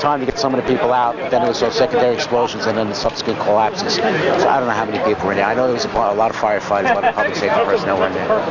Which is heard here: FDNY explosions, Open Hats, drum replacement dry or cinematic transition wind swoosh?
FDNY explosions